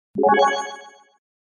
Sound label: Bonus level up; Power Up and Level Up Sound Effects